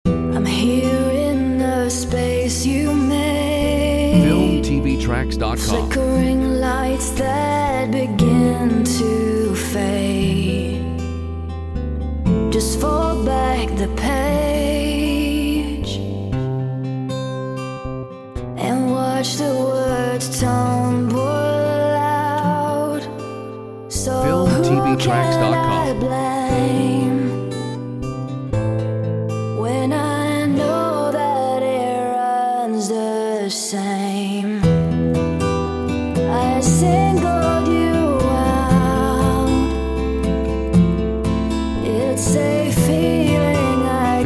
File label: Acoustic